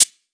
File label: Perc Shaker Full, 909; Slate